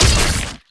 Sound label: imp dual swords